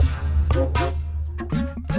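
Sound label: Music Base 120; From Dust; PS3; Xbox 360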